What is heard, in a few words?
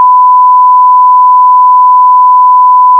tone 1kHz max